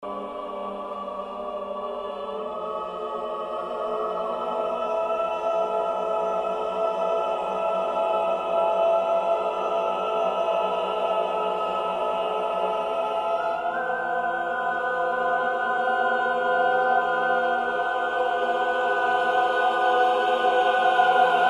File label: scream; Choral "cluster"; Full Choir & Orchestra; Moviedrome - Cavendish Music